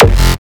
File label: kick oneshot lilmonster F#; GhostHack